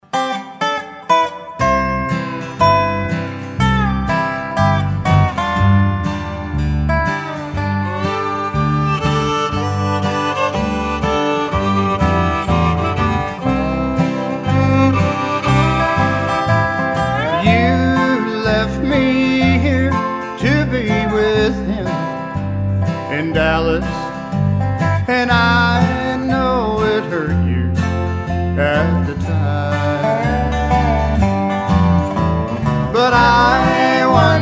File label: Country Band; Hartford